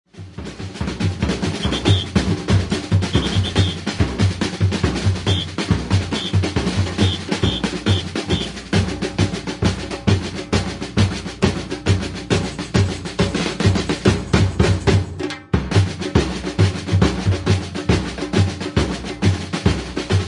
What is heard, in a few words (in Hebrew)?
Break UP, מעבר 3 עליון מלא; Batucada